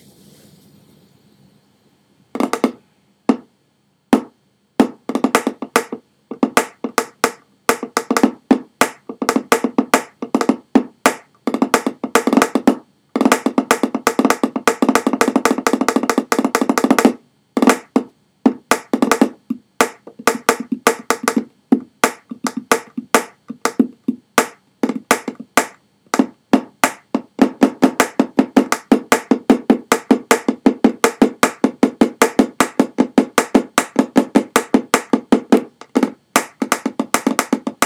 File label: Ukulele Tapping